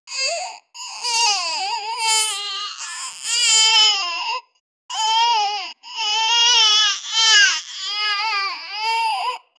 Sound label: realistic cinemat, baby crying